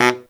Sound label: baritone fx; Zero-G; Phantom Horns